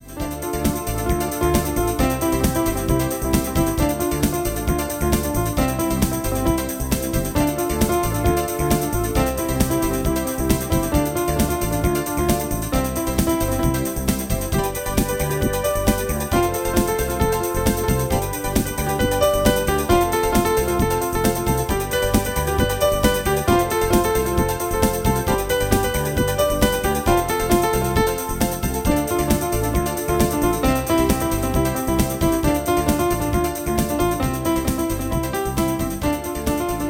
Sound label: Fast Motion